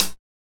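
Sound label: rap closed hi-hat; Drums In Your FACE!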